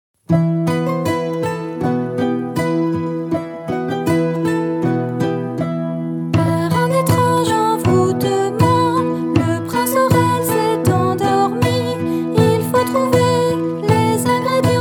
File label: REVEILLE